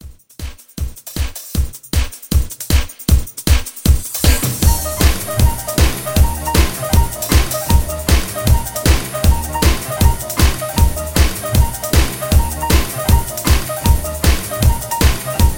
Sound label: 50s Blues Re Drum